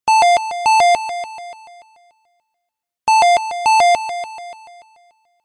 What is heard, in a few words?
chime shop